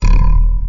jump pad; kakujitsu

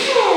dog servo; hl2

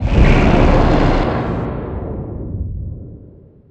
portal beam shoot; citadel; hl2

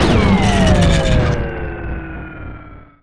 die, floor turret; hl2

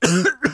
cough, voices; Sourceforts v1.9.2; hl2; ambient

